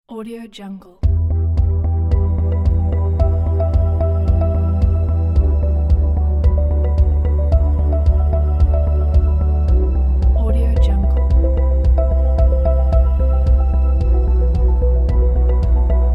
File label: calm documentary